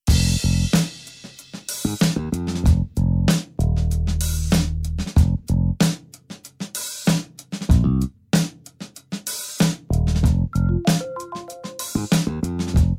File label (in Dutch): Pannenkoeken Rap backingtrack